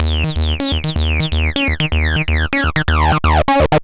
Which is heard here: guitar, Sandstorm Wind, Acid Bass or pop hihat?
Acid Bass